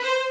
violin

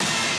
stab

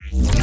bass

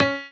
piano melo

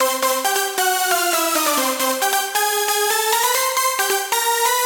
synth

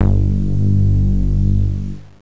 bass; Angelic Layer

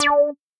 synth

